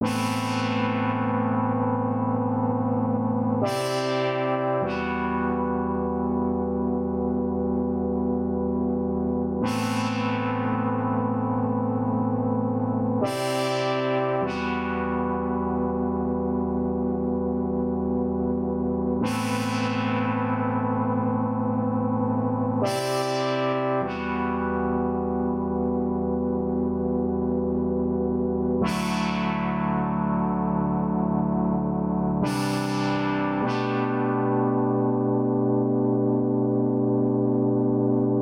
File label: Synth Organ